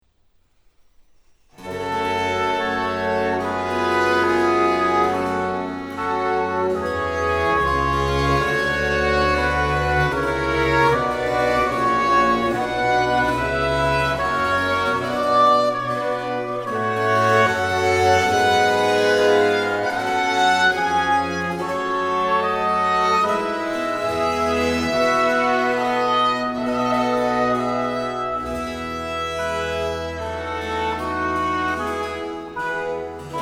Concerto in G Minor for 2 Oboes and Bassoon, Grave; TWV 53 g1